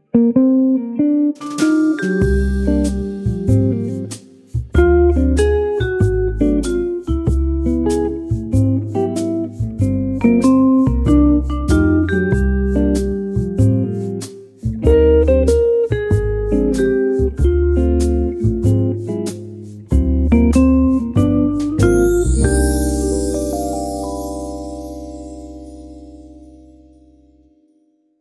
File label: jazzy lounge beat